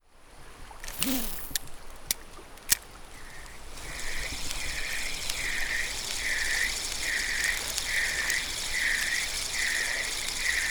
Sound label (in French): Peche au lancer